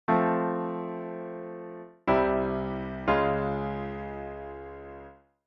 Rota V A B Vi 1 F, cadencia; auditivo de cadencias autenticas plagales rotas y semicadencias; Identificar la cadencia

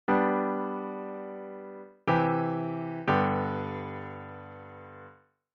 Cadencia Plagal Iv a I, G; auditivo de cadencias autenticas plagales rotas y semicadencias; Identifica la cadencia

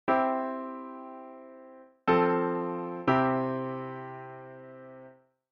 Cadencia Plagal Iv a I, C; auditivo de cadencias autenticas plagales rotas y semicadencias; Identifica la cadencia

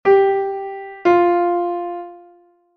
2ªmayor Descendente; intervalos musicales; ¿Qué intervalo suena?

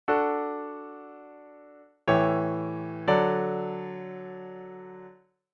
V7 a I, D; auditivo de cadencias autenticas plagales rotas y semicadencias; Identifica la cadencia

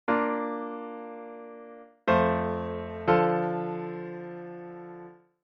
Rota V A Iii 3 Bb, cadencia; auditivo de cadencias autenticas plagales rotas y semicadencias; Identificar la cadencia

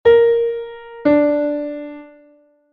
6ª Menor Descendente Sib3 Re3; intervalos musicales; ¿Qué intervalo suena?

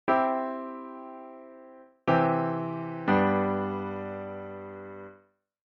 Semicadencia I a V, C; auditivo de cadencias autenticas plagales rotas y semicadencias; Identificar la cadencia

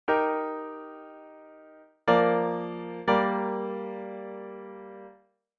Rota V A Iii 6 Eb, cadencia; auditivo de cadencias autenticas plagales rotas y semicadencias; Identificar la cadencia

